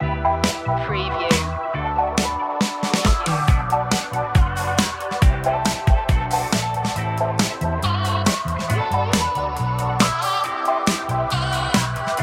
fashion reggae

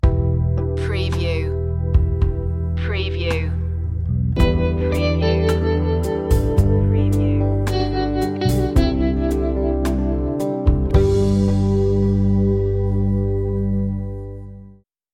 ambient emotions stinger

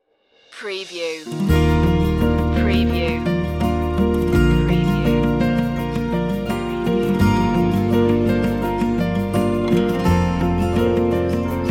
acoustic escape

